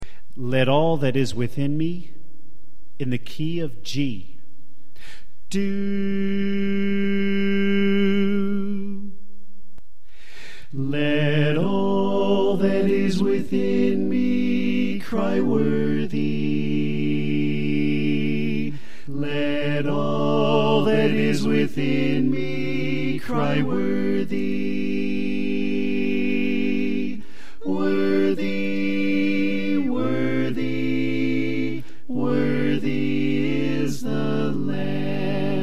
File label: Tenor Left